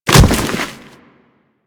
Bodyfall; Warframe; Kubrow; Bodyfalls

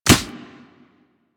Melee Fist Impact Generic; Warframe; Weapons; Fists

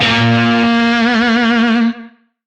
Shawzin A Sharp; Oct One; Music; DE; Warframe; Lizzie Shawzin

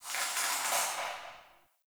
Cave Ocean Creature Far; Ambience; Midrath Island

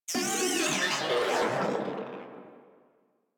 Vocal Death, Enemies; Warframe; Moa

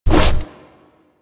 Generic Vault; Warframe

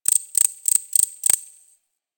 Forest Close Insect Chirp; Ambience; Warframe; Grineer Forest

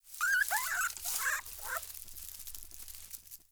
Beaver Idle Chatter; Encounters; Animal Rescue

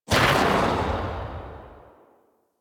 Cowgirl Pistol 2D Tail B; Tenno

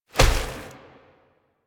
Wolf Step; Animals; Warframe; Quests; Duviri

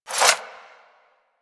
Unequip Dual Daggers; Warframe; Weapons

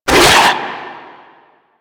Proto Infested Runner Arm Attack; Warframe; Abilities

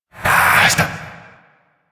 Possessed Vocal Attack; Warframe; Priest Quest; Possessed Red Veil B

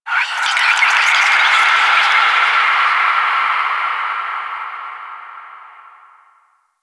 Moon Whispers Small, Ambience; Warframe; Orokin

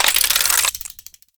Mech; Warframe; Weapons; Corpus; Crp Freeze Ray; Tenet Glaxion

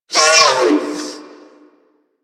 Necramech Repair Drone Idle Surprised; Warframe

